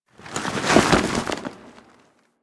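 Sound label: Settlement Cloth random ambience; Warframe; Grineer Settlement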